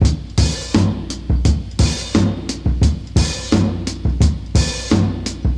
86 bpm drum groove D key, Free breakbeat sample - kick tuned to the D note. Loudest frequency: 970Hz